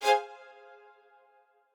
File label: strings